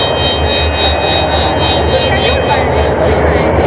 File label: alarm